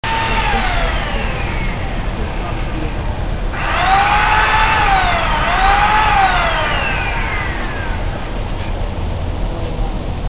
Standard Back Yard Noises - Always Someone Cutting Wood Somewhere